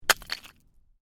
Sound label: Ice: small chunk of ice lands on hard surface; Snow Sound Effects; Stuart Duffield